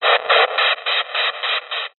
science fiction radio signal interference burst

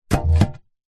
industrial shovel metal drop or set down grass

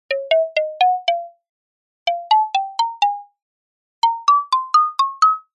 cartoon footsteps short sneaky wooden mallets; zapsplat

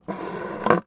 straw slide out of plastic lid drink cup; food drink; macdonalds

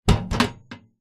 metal box large set down soft surface; foley

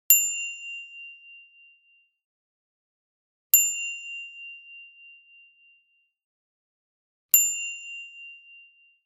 Bicycles, Ring, Bell; lilesound

